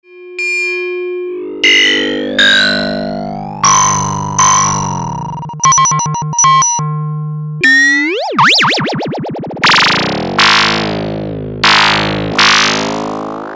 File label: VCO Mode C LFO Sweep